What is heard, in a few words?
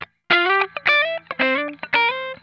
GLIDE, Funk Guitar; Zero G - Funk Guitar